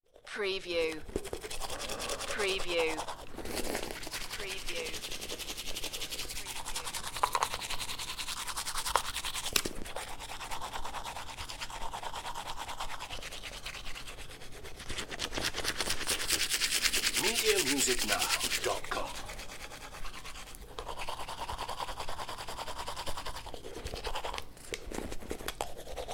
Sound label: Brushing Teeth, Toothbrush Cleaning Teeth Fast